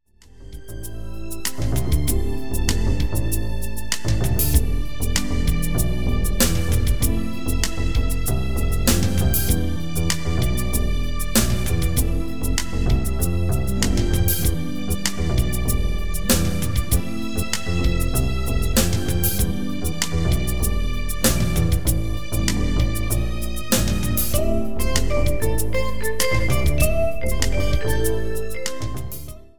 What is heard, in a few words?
A little cool jazz